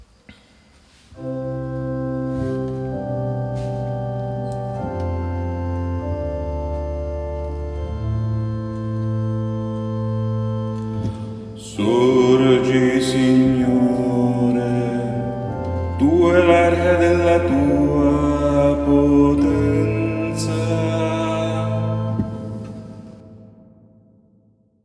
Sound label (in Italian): Salmo Bassi; assunta